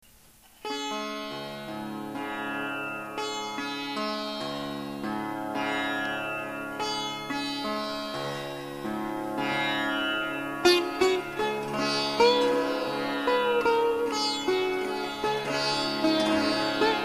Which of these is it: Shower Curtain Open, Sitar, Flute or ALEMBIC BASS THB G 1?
Sitar